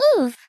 Oof; Miku; SM64 CO-OP; Triple Baka Pack

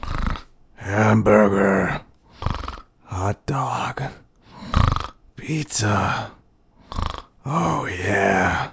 snoring; foreman spike; SM64 CO-OP

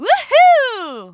"yahoo"; pauline; SM64 CO-OP

